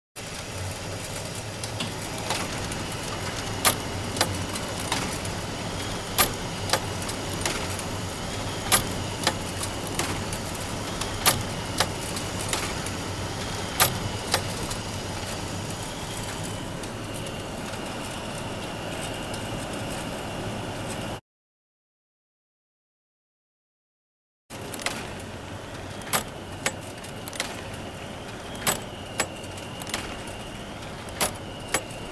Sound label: Office Photocopier Sound Effect